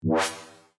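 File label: Healing Waters; VELA; Eagle Eye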